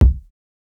kick, house

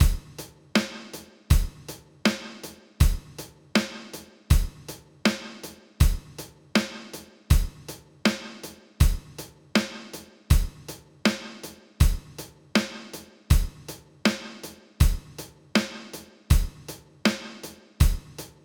hall resonance loop 80

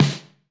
snare, snare house

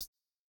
Jrock hihat

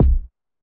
Hiphop kick; Reverb+EQ+Comp+Limit

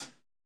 hihat, lofi hiphop; Legendary lofi hiphop

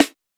hiphop snare, Effect on

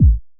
trap kick; Hiphop

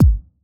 kick

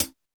Electro hihat; Reference Beat

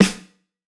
Electro snare; Reference Beat

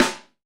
snare samples, Rock

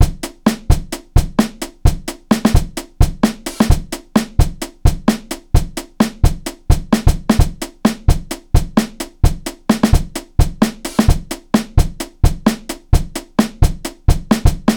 Rock loop; Reference Beat

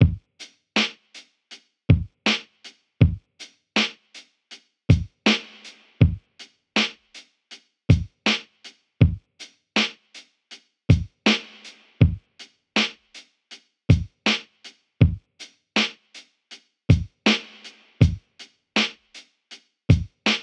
Jazz hop loop 80; Hiphop

